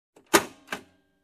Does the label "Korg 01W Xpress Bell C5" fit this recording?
No